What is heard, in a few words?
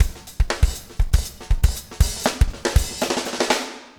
breakout 120, 120bpm, loops